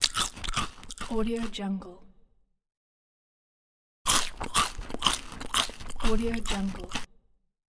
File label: eating